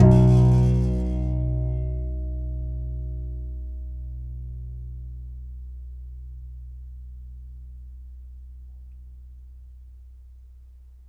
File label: C. Bass Balalaika, Plucked Strings